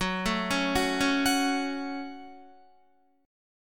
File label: Gb Minor Gb Guitar; arpeggiated; JGuitar